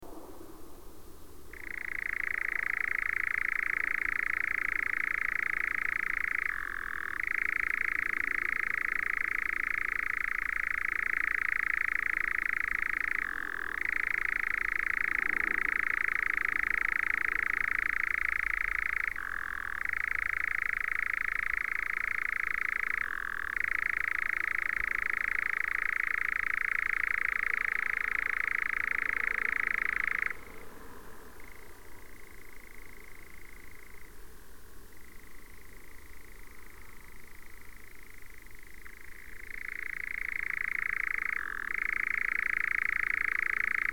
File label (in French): engoulevent d europe, oiseaux; engoulevents; faune